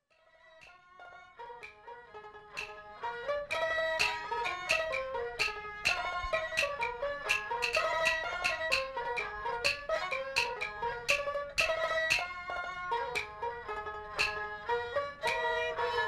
chinois, voix ethniques

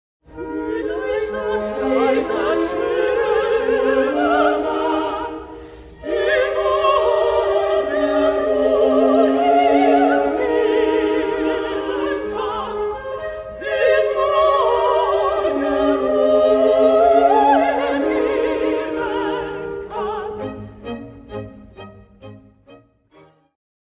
trio femmes; la flute enchantee

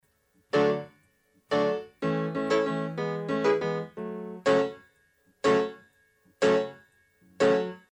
pano, reggae

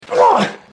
aouhh

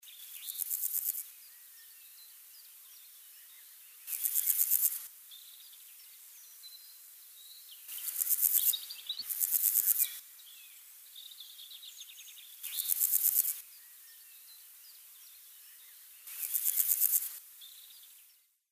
criquet; carnaval

